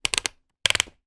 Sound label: pedestool fan head adjust clicks; household